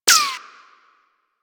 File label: Bullet Impact Ricochet; Warframe; Weapons